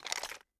ui pickup gun; Rust

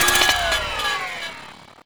mgsj idle fire end

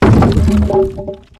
objects, World of Tanks

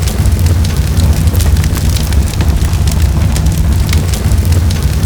fire small loop

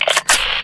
art ready, tanks

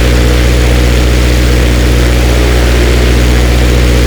Aircrafts Engines Rolls Royce Merlin Prop; DCS

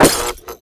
lamp break

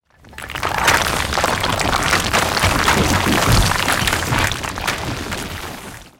building crash, tanks; War Thunder

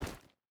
footstep rubber boots stones run; Rust

